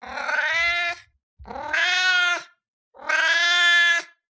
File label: Cat meowing begging for food